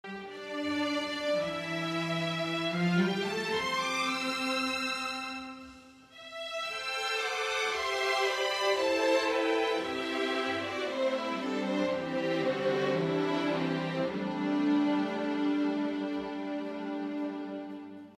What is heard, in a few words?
str solo